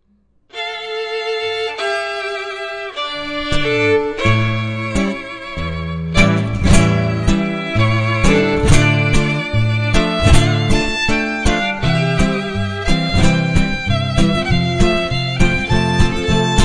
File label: hungarian chardash